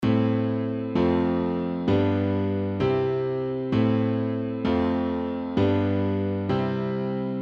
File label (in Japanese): 小室進行